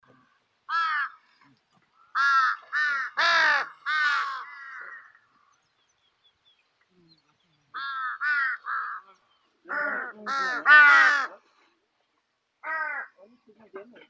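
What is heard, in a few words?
large-billed crow, Corvus macrorhynchos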